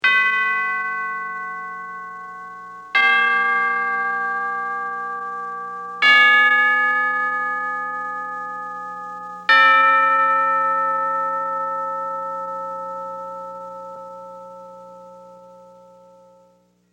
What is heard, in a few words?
Tubular Bell Ascending; Bell Sound Effects; Bells (Sound Effects:Musical)